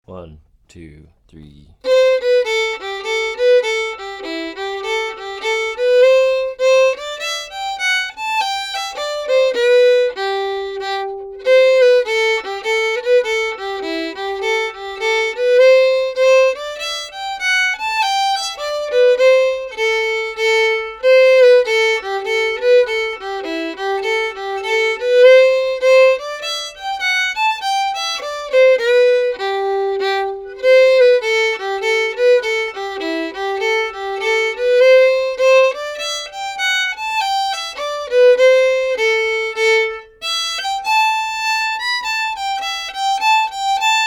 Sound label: hornpipe; tuamgraney castle